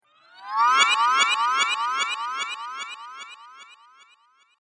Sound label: Cartoon Game Spell Drifting; Magic Sound Effects